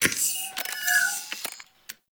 Hi Tech Clicks; Cave; Ambience; Warframe; Stalker

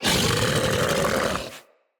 Kubrow Feral Vocal Alerted, Enemies

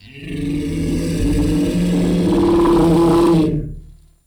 Ancient Tank Death, Infested; Warframe

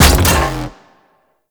Detron Shot, Weapons; Warframe; Void Trader

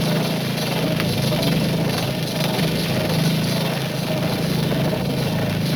Boss Laser Loop; Warframe; Caliber Chicks

